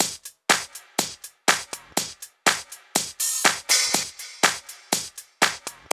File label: house loop